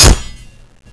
sledgehammer hit

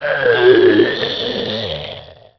zombie idle, male

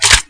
m203 open, weapons

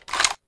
mp5 empty mag out

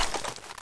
desert eagle draw, weapons

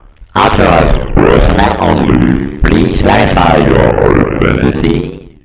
hall door

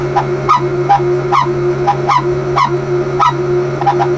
saw

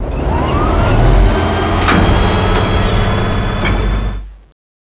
tank prefire

